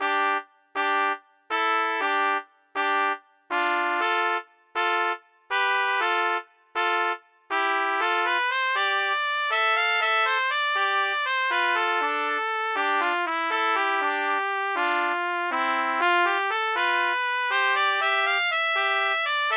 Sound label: duo trumpet